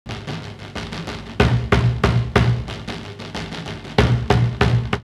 Beira 93, drumloops World Ethnic; X-Static Goldmine

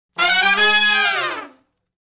Fast Climb Up (Ab) unison, Horncraft; Sections

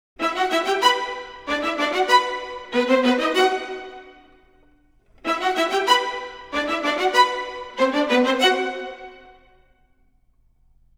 95 e min strings theme, Orchestral arrangements

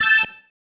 Stab, Organ, One Shots

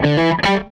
SG Guitar Loop

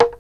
slit drum; Crypton - DrumBase5000; AFRICNRHYTHM

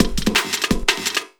LOOP, 170 BPM

